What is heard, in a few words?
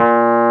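WURLY NO, Wurlitzer electric piano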